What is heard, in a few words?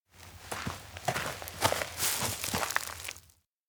steps outdoor ranch walk arrive; anemoi